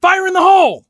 grenade; Escape from Tarkov

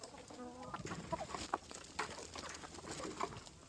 pigeons loop

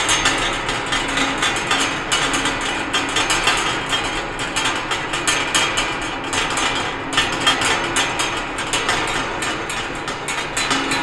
Amb Constructions; Red Orchestra Ostfront 41-45

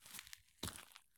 soil turn; Escape from Tarkov

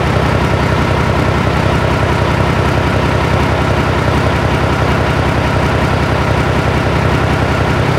pt76 engine rpm 00, tanks; War Thunder

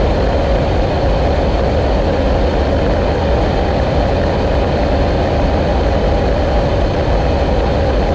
Aircrafts Engines Cockpit Afterburner; DCS

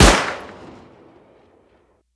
smg attack; Rust

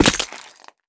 foot glass stone, footsteps

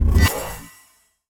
Notification; Wonyong Ryu

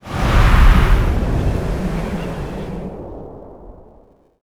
wind

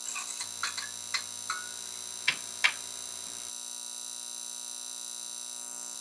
flour light buzz

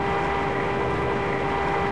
Radar Rotation; DCS

